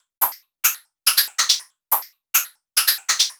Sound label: Anabeat Hat; Sonic Foundry (Sony Creative Software); Prototechno